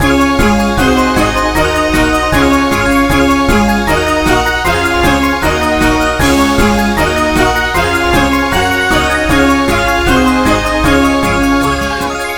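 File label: background music bonustime day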